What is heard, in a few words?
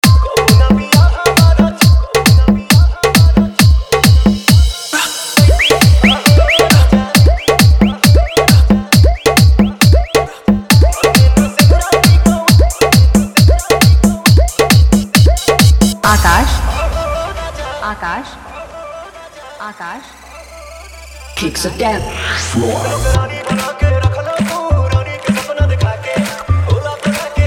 Bhojpuri Dj Remix